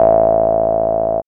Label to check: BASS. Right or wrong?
Right